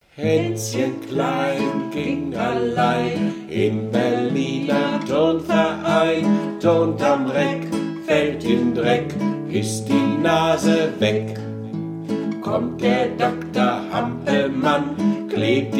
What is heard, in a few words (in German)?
haenschen klein berliner version; kinderlieder